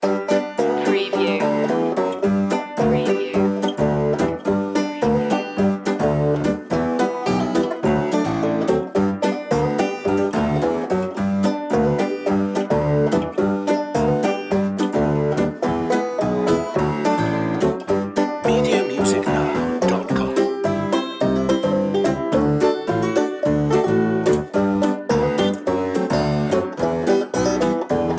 shimmy

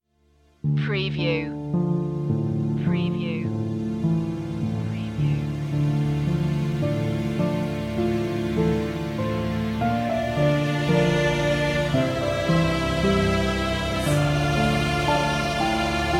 hymn

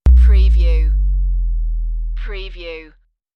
Bass Dive

